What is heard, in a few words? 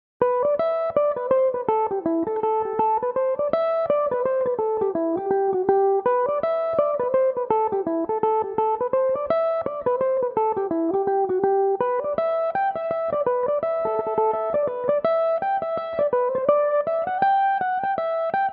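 A dorian, irish mandolin, tunes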